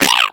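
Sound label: eggbot turret fire; player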